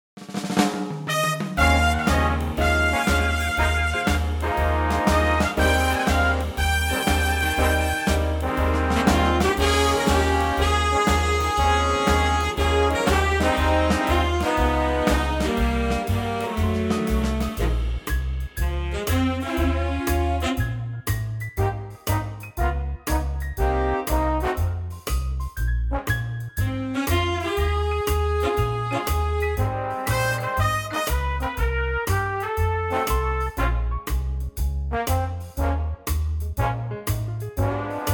swing Ab big band

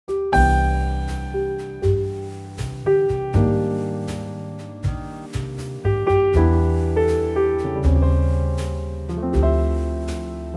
C trio; key - C - vocal range - G to D; Wonderful Trio arrangement of this classic standard

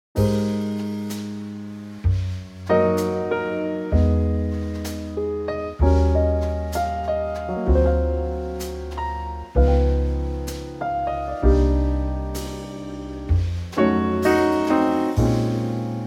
Trio Ab

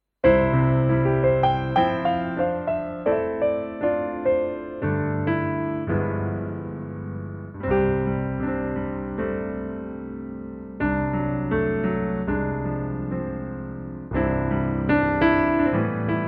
piano

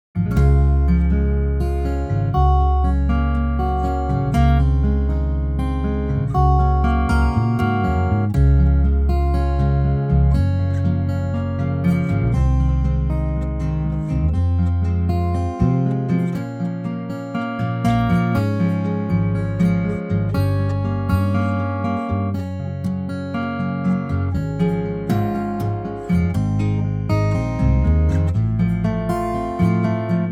acoustic Db; key - Db - vocal range - Bb to Db (optional E)

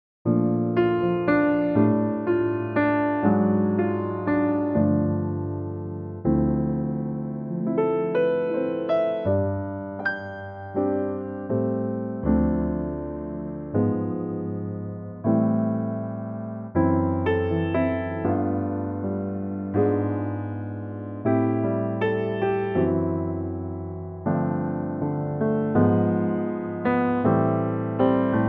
C Trio; key - C - vocal range - C to Eb; with the verse included, all staying in tempo

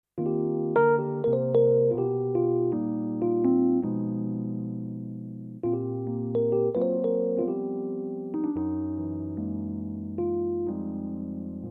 Eb funky; vocal range - C to D

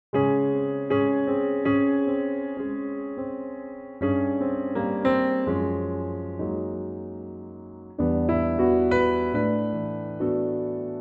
piano Db; Pianotrak; key - Db - vocal range - Bb to Db; Fabulous piano only arrangement

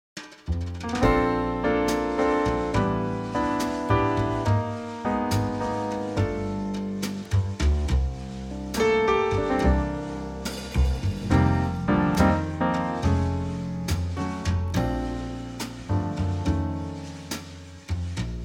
Trio Bb; key - Bb - vocal range - Bb to C

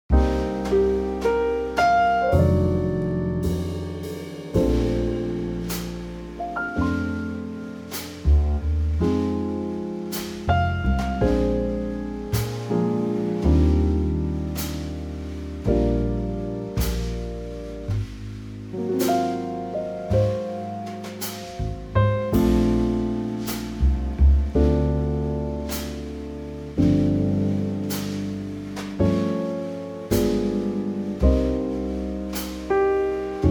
Bb TRIO; key - Bb - vocal range - A to C

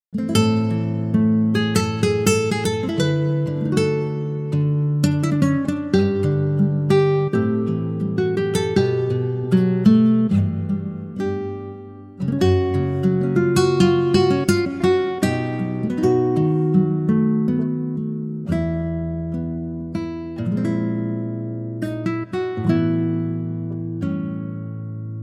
D guitar

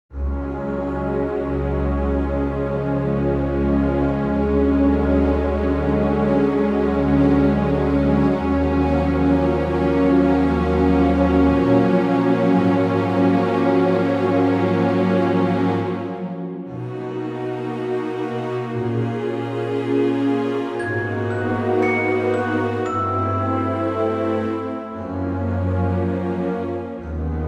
G b orch

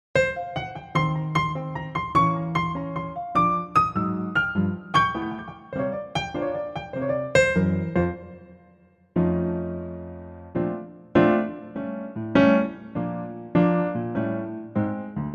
Bb piano

